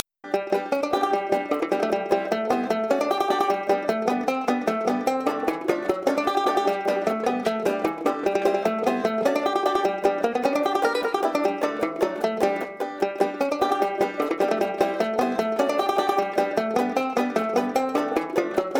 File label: Hittin hay Banjo piano No harp; gamerip; Barnyard; playstation 2